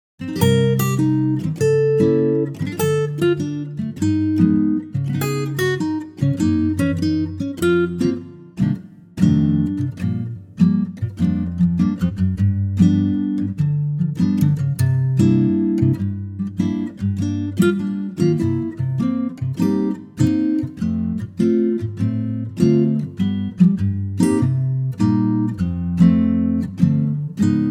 Bb Ac Gtr; Acoustic Guitar; key - Bb - vocal range - A to Bb (optional C)

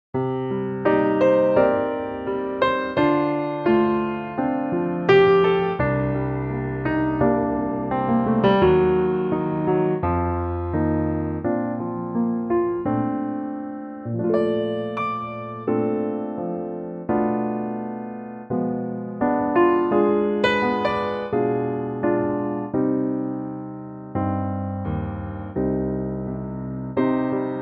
piano Bb

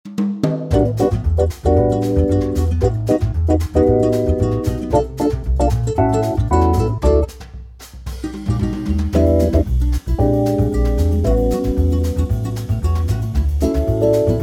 D latin

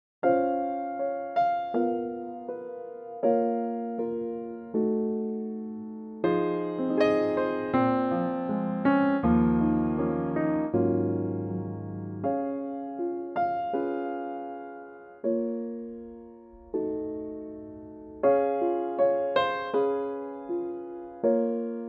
Db Piano; key - Db - vocal range - F to Bb

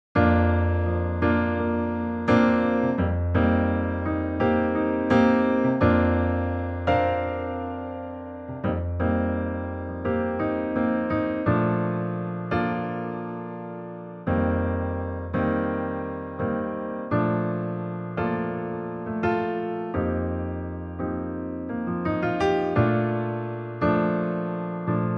Bb piano

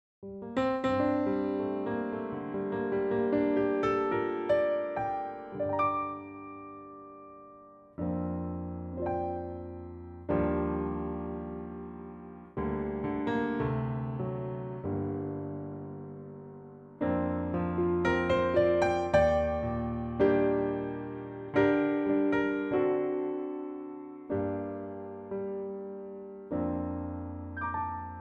trio

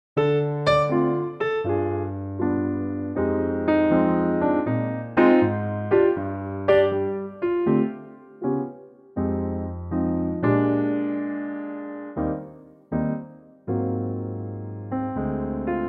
Eb piano

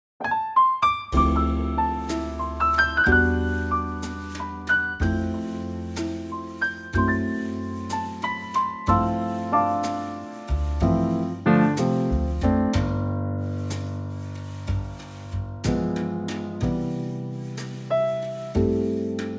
C trio; key - C - vocal range - C to D (optional E top note); Beautifully slow Trio arrangement

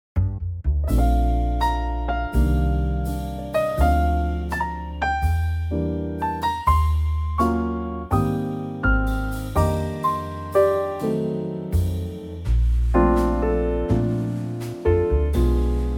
trio; key - Bb - range - E to A; A very classy Trio arrangement; from the 30's

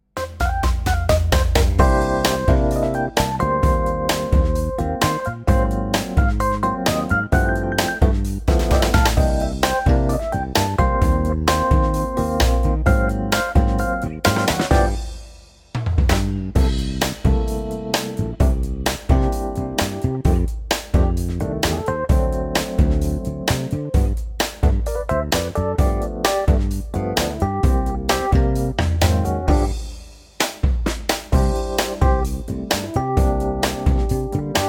C funky; key - C - vocal range - B to D

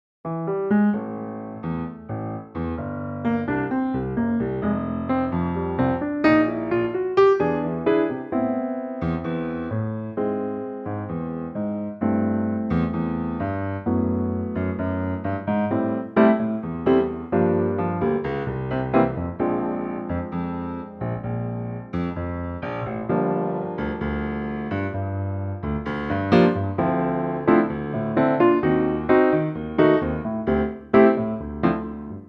Am Piano; Pianotrak; key - Am; range - B to Db; Piano only Bossa arrangement of this great old standard

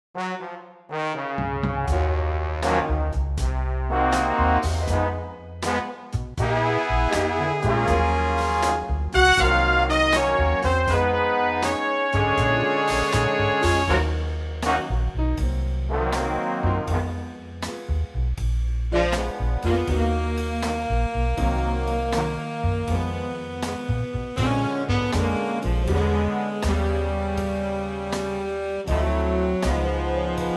Ebm Big Band; key - Ebm (Gb); vocal range - (optional Bb low note) Eb to Db; Big Band arrangement

